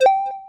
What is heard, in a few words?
Motion Design Button Tone; SoundsCrate